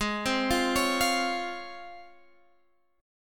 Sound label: Db Major 7th Ab